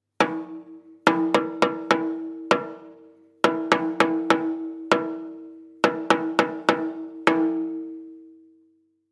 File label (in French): impro tambourin; loto sonore